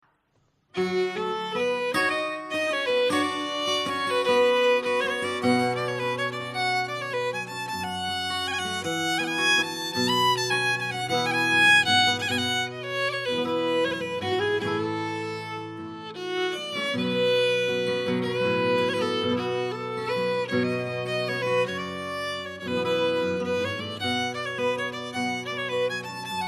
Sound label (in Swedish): Bluesvals